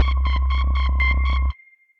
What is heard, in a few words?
Pulse Drone Beeper, Sci-Fi Effects; Ableton; Mood Reel